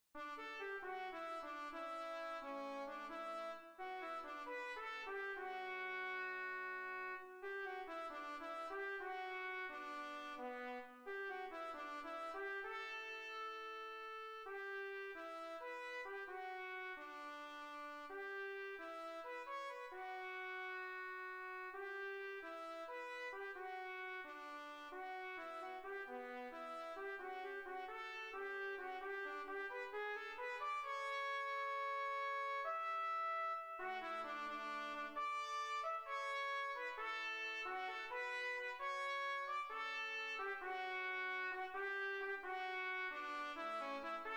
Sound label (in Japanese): トランペット